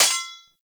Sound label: Melee Sword Impact Generic; Weapons; Swords